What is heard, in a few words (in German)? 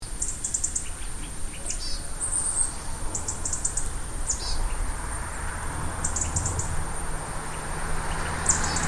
weidenmeise; sattnitz